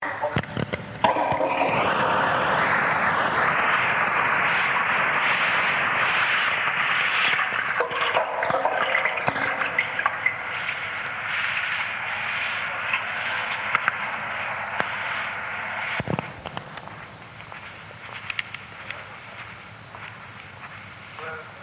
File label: flushing the toilet and walking down corridor; 5b at the university of essex